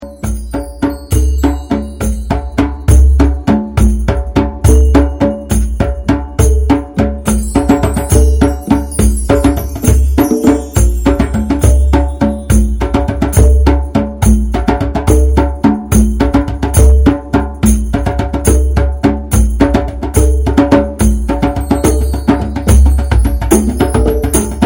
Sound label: 6 beat